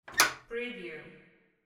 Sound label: glass door open